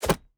environment object grab magic scroll

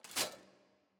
ambience room armor training

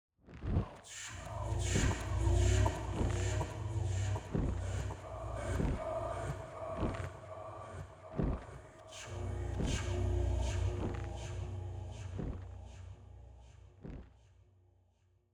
Demon Minion Idle; TANK; 3Souls

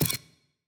ambience room dark temple ritual stone ready

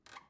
ambience room lair work layer

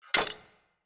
morgenstern put; player

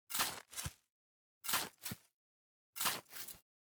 block digging up

